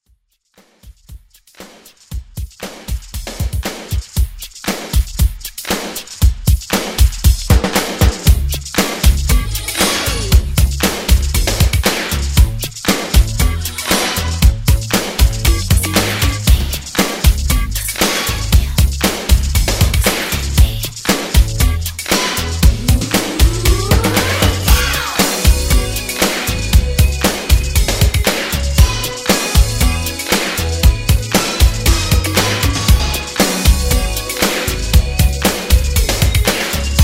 80s Redrum

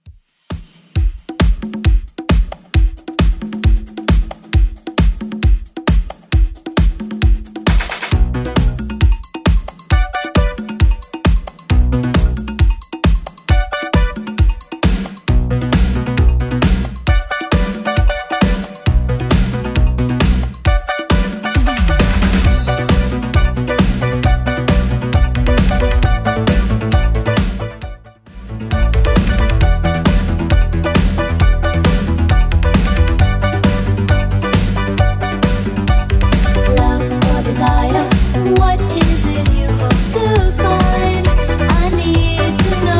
80s Redrum 134bpm

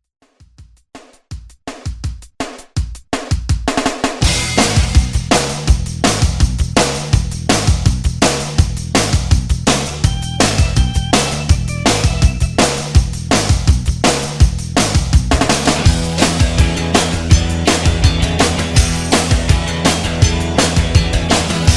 80s Rock; Redrum

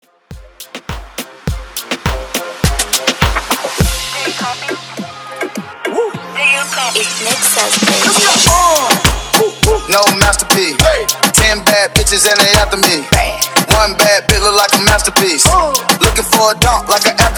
Heavy Reggaeton Re Drum 103 BPM

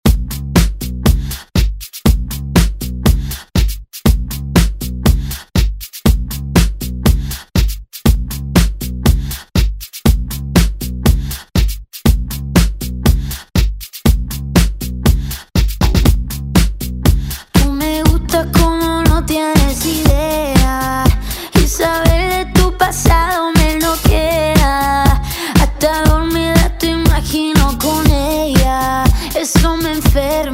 Latin Pop

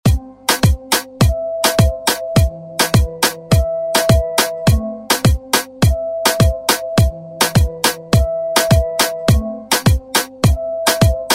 Moombahton Redrum

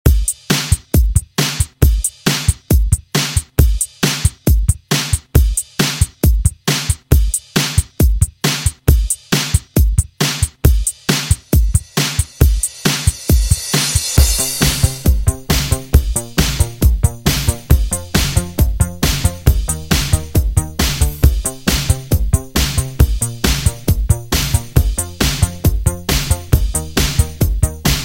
80s Dj Intro Outro 136Bpm

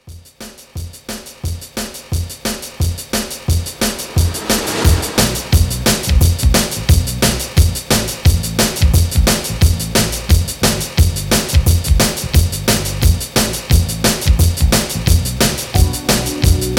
80s Re-Drum 176

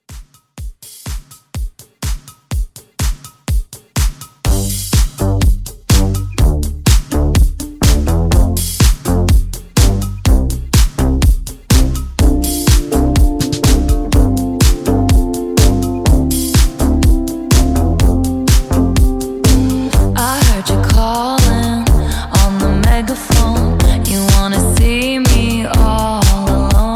Re Drum 124

